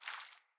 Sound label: Fawn Footstep Water; Foley